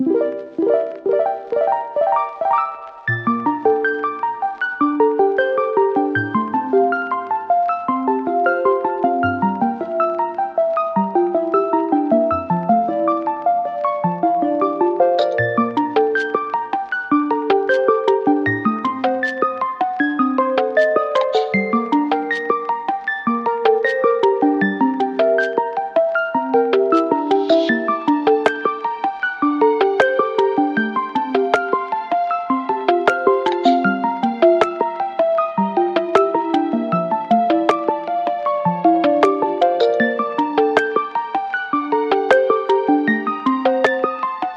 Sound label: bg menu; fancymenu